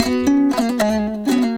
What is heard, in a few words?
HALAM MELODY; Best Service; World Colours